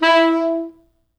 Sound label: E3, Pop Brass